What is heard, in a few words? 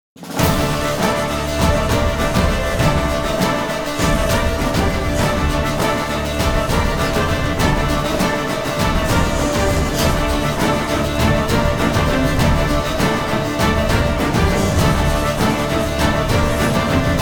Combat; The Witcher 3 Wild Hunt; Q203 Combat Him Sequence